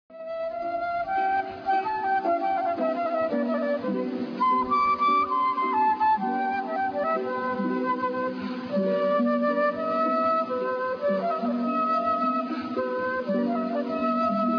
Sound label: classical